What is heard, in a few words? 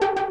synth